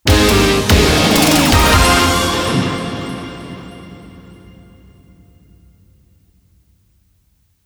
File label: large Win